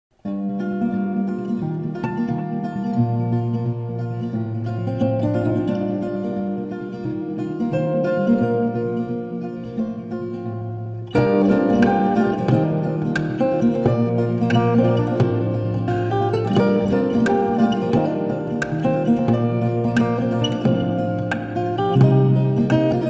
Acoustic Guitar Ringtone; Mi

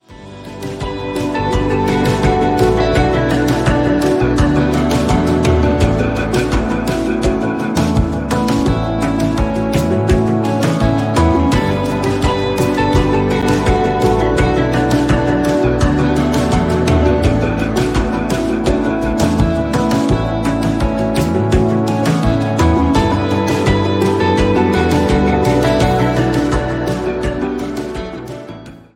Classical Ringtone

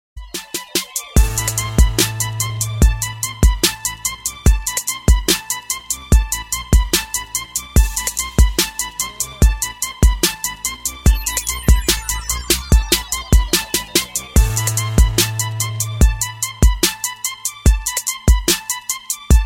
Samsung Galaxy S7 Official Ringtone

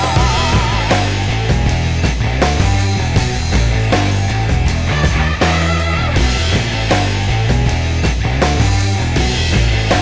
Huawei Ringtone

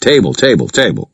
Table Table ringtone

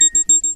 Oppo Reno 7 Pro Notification Ringtone

